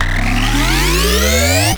Electro Mag Charge Up; Warframe; Corpus

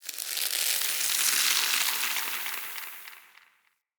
Under City Vine Creak; Ambience

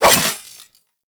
Nikana Swing A; Tenno; Revenant

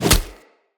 Kick Impact Default; Envoy

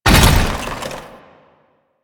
Motorcycle Collision Fast; Warframe; Motorcycle Ninety Nine

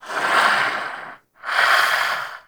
Vocal Breathe; Warframe; Duviri; Dax

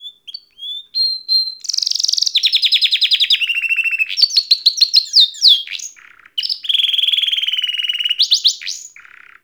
Forest Bird Sing; Ambience; Warframe; Grineer

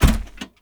Step Default, Footsteps; Characters; Enemy; Mendicant; MndKing

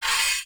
Cargo Train Exit Bolt Slide; Warframe; Corpus; Railjack

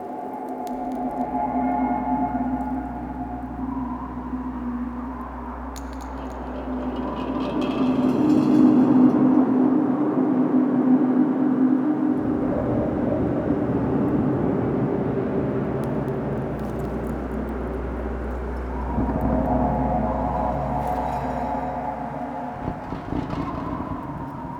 Interior Amb Bed, Tunnels; Tau; Sentient Tunnels

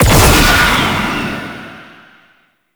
Unhallowed Curse Hit; Warframe; Dagath

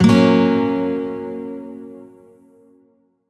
Shawzin Yo Chord F; Warframe; Lotus Shawzin

